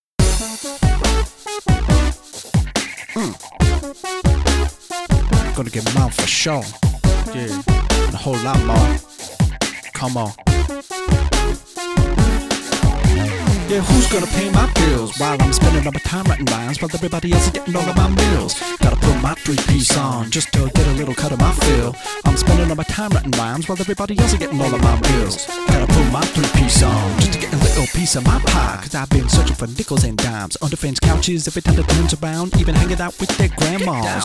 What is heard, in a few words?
rapper